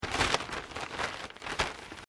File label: PAPER BAG LARGE UNFOLDED; Material components for constructing more complex sounds; Materials